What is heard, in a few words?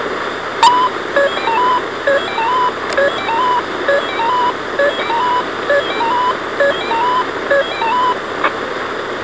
EMS toneout; Douglas FD